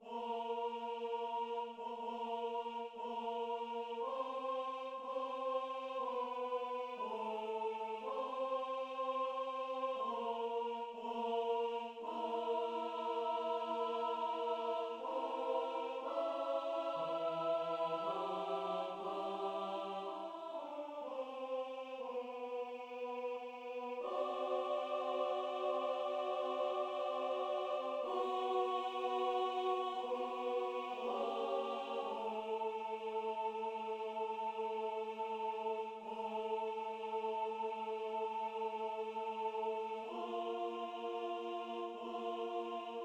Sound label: Fugue